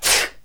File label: NOISE, CLASSIC VOX; Best Service; Hallelujah